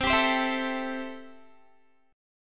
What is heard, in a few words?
C 6th, Guitar; strummed